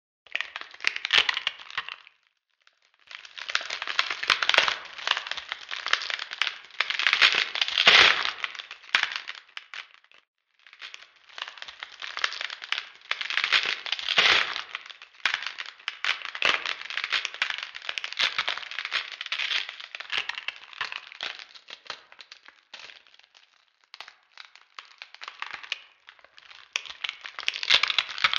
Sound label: the ice is cracking ice cracking